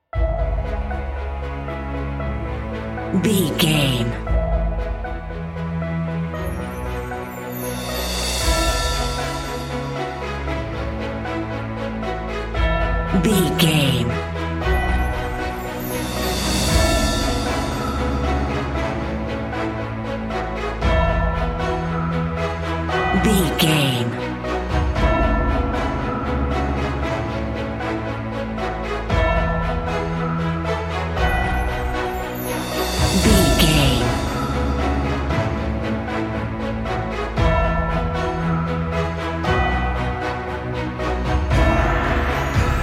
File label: cinematic corporate bed